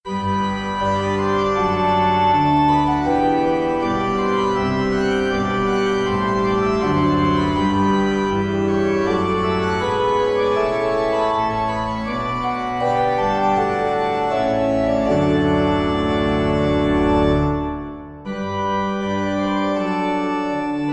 Organ Air; Organ Miniature; Georg Philipp Telemann; Partita in G Major

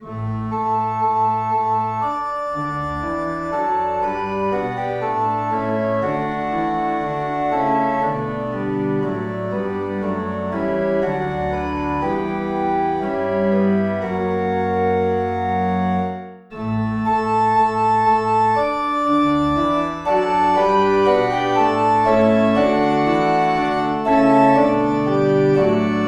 Organ

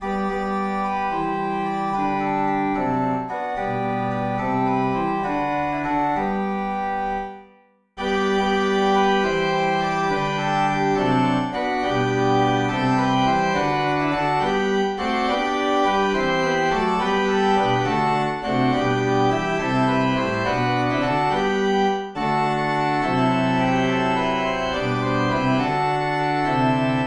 Organ, hymns without words

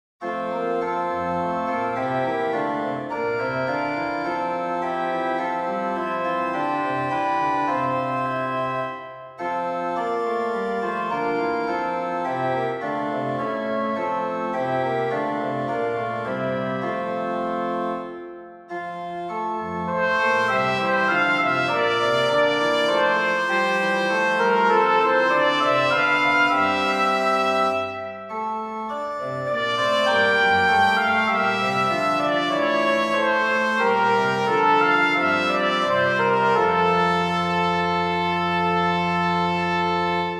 Descant Practice, Organ; Willcocks

